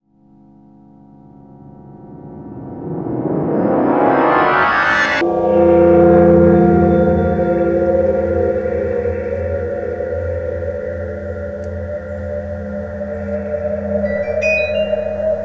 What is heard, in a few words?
electronic ringing, carillon